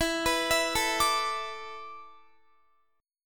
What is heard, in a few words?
E 7th Suspended 4th, Guitar; E7sus4; arpeggiated; x,19,21,21,21,21